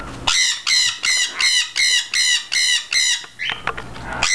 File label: squawk, sun conure